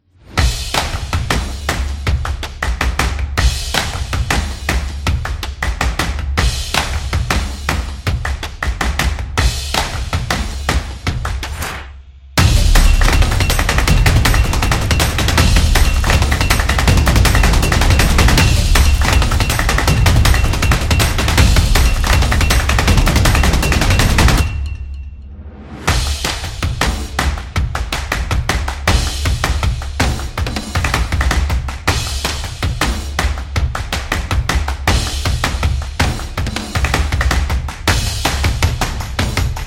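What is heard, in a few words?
Gear, Street percussion background music built for sport, motion, and dance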